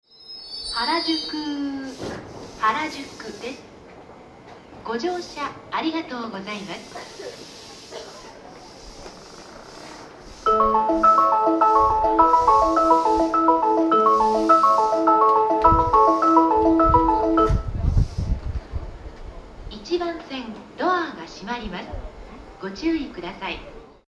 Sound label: harajuku melody, Tokyo Train Tunes; japan